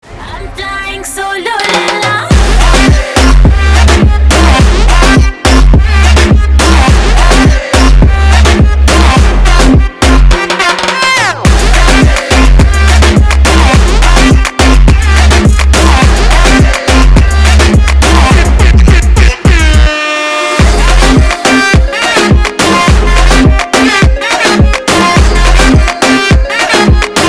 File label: Instrumental